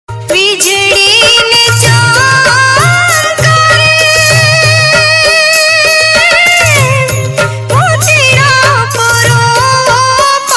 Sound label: gujarati ringtone